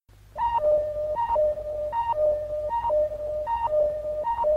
low energy; ultraman

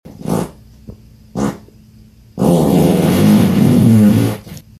farting

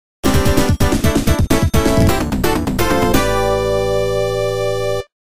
sonic 3 knuckles act clear music

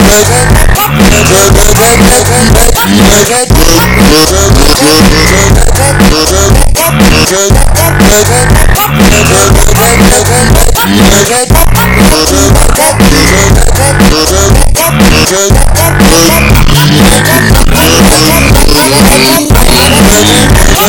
Game Over; Friday Night Funkin; VS MXMario

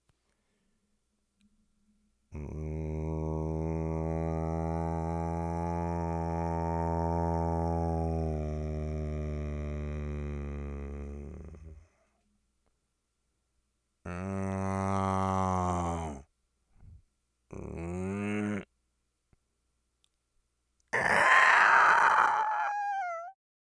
monster moan and squeel

